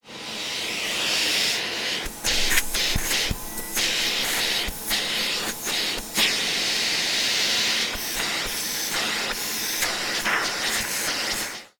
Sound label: Vacuuming Sucking